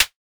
Mid Perc, Data Mosh Hits; Snare - Clap - Mid; 99Sounds; Mangling Audio

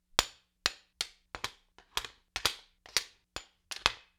Axe Sabre Haft on Hilt, Weapon on Weapon; StillNorthMedia

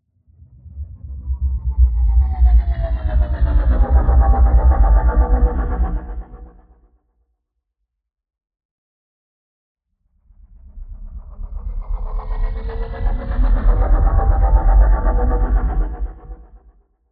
Just Whoosh; SoundBits

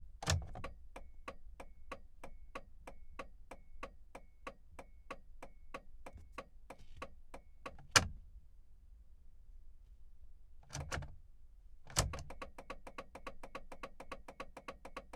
Dodge Ram Diesel Turning Indicator; Pole Position Production; MS Decoded

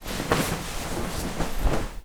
person removing jacket; 3maze; The Closet

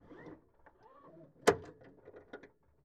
Nissan GTR Seatbelt Interior Gearbox; R35 2012; DPA4062

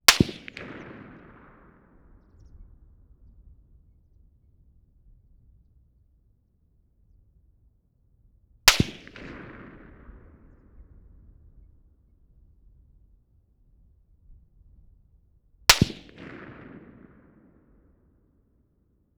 MOSIN-NAGANT 50m distant front left shots; FLYSOUND

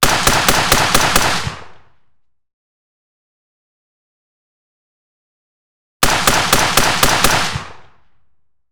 HK 416 5.56mm 1m left off axis clean Six shots; Heckler & Koch; MKH416

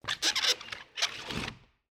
Wooden Plank Scrape; 99Sounds; The Warehouse

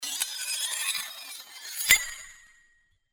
Sword Sound; FXHome; Fighting Sounds

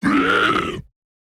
Creature Monster Pain; MatiasMacSD; CREATURES ZONE

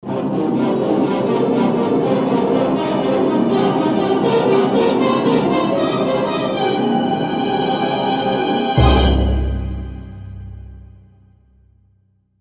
Orchestral Phrase; FXHome

